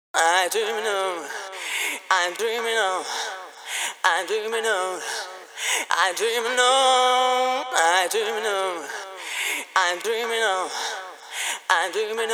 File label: Acapella